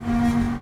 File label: apply, Train, R62 Kawasaki; OpenBVE